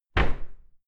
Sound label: cupboard close hard subdued